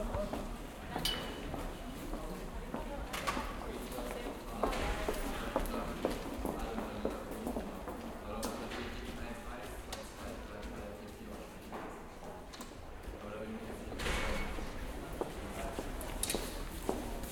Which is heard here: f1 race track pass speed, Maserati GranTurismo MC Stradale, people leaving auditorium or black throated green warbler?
people leaving auditorium